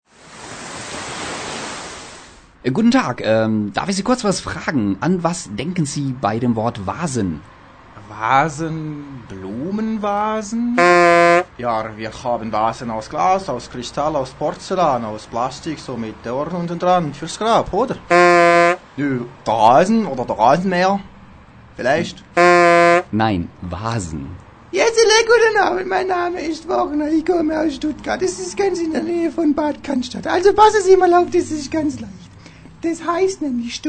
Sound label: Jingle; Gaydelight; Podcast